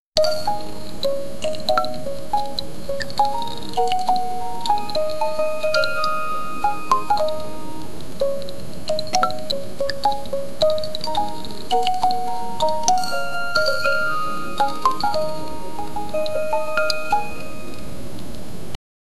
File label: mood wonder exploration